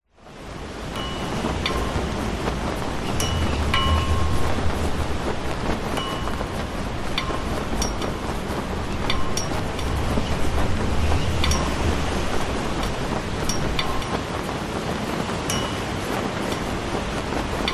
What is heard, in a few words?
foley flag blow windy pole rope hits; zapsplat